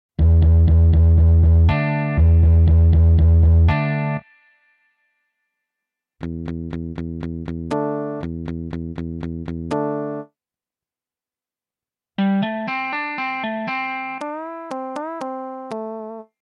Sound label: Palm Muting; solo techniken